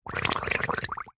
foley balloon pump in shallow water release air bubbles; zapsplat